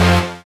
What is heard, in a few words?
SYN, dance; Sound & Vision - Gigapack II